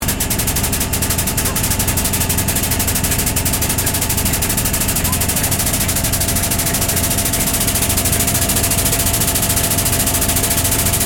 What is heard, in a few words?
Train Passenger Xtrapolis 100 Faulty Mechanics Loop; Melbourne; Surburban train with faulty equipment; Trains